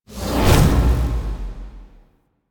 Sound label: Magnetic Field Cast; Warframe; Focus; Unairu